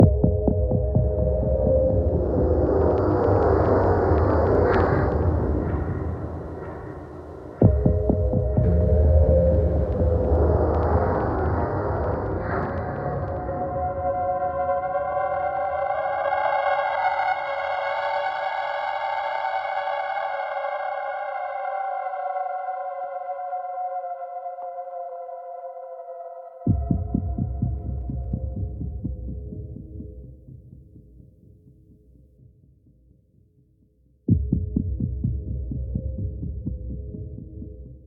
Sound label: Sneak